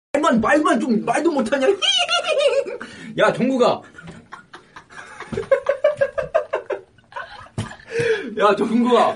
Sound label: instrumental